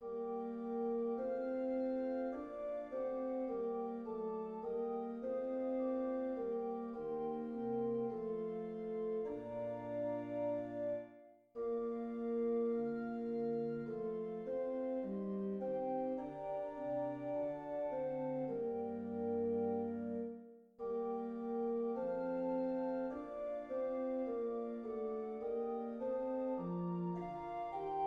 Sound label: tenor emphasized, choir; bach; lob und preis; bach j s lob und preis sei gott dem vater und dem sohn bwv 10